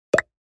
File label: Cartoon funny pop